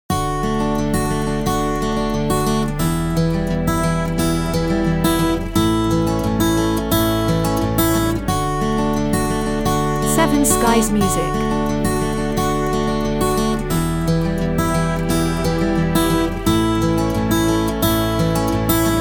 Sentimental Guitar

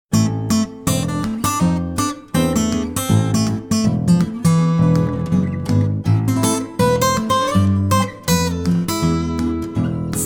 Gipsy Guitar

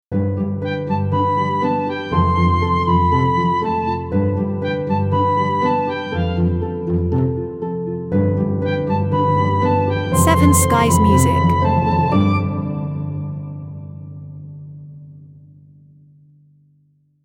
Crescendo Stingout

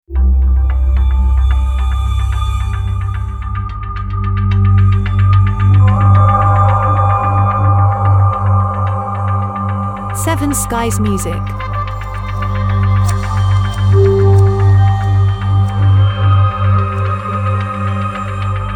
Ambient Dark Film Series

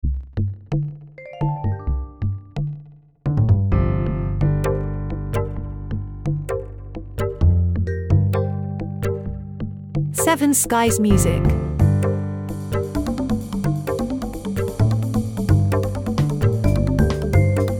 jazz motivating

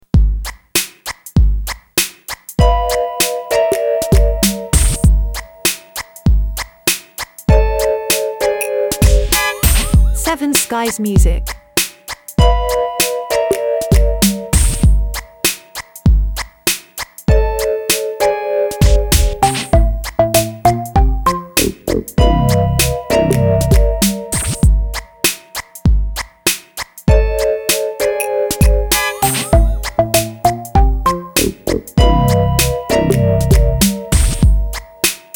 Children suspense

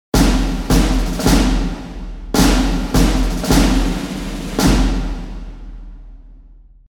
Big Cinematic snare drum

